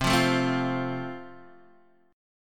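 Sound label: A Minor Sharp 5th, chord strum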